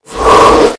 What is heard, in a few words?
leer projectile ally; Valorant; reyna